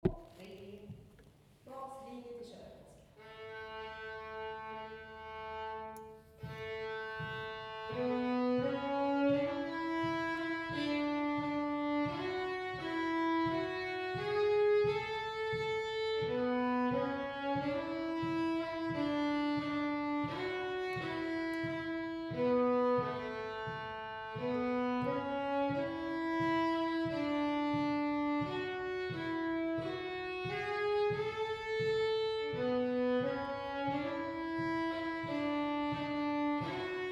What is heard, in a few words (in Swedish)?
Baslinje; Körepolskan